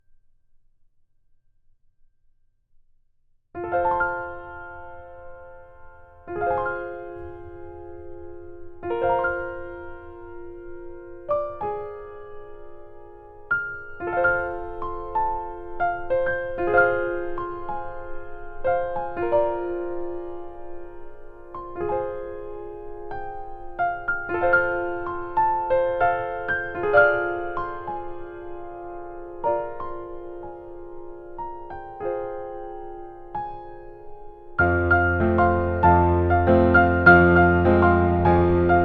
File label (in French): 4 accords